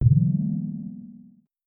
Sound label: ITEM TRANSFER; GameJamDungeon